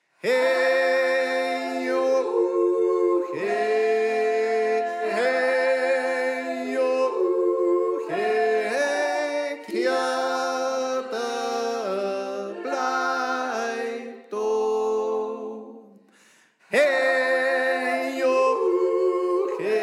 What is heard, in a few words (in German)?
Tenor